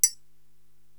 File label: Triangle Mute